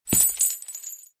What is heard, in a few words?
footstep; chriswalker; slender